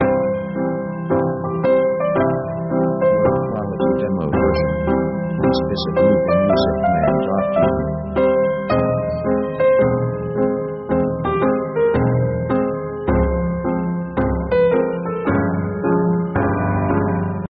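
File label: orchestral strange victory march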